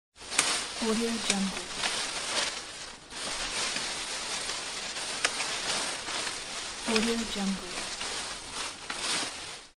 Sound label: rustle